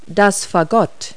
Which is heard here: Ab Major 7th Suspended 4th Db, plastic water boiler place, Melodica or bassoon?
bassoon